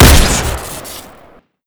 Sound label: syringe shot shoot crit; weapons